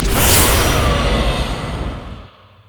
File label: spectral; LoL; yorick